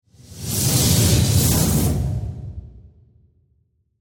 Mechanical Metal, Mechanical, Metallic, Parts, Swirl, Transforming, Robot, Production Element, Imaging Element, Accent, Transition